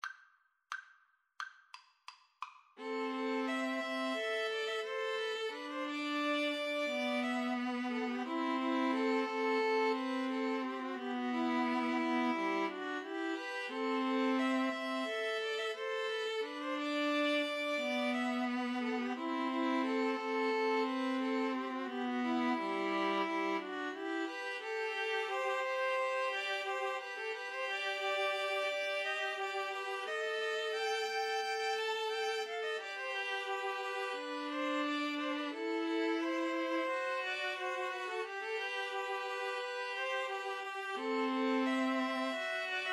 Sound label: string trio